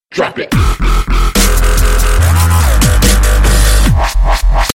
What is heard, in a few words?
House Music